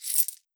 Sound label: coins